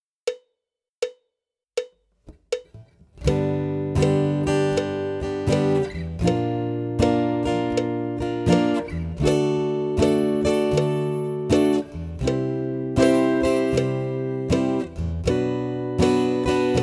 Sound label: Bar Chord Study